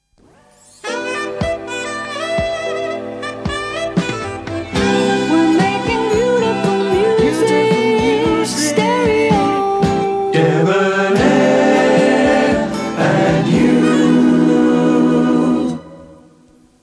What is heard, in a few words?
Beautiful Music; devonair; idents